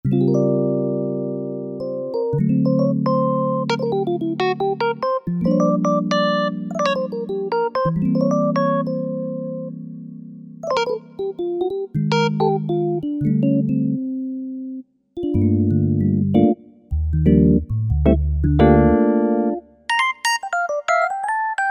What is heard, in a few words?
Electric Dyno